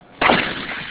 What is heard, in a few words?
shoot